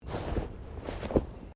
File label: Body movement Clothing Foley